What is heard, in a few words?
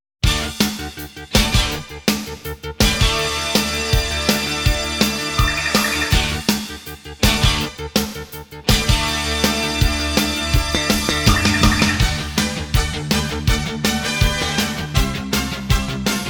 Karaoke; Gunbuster; Sound Collection of Gunbuster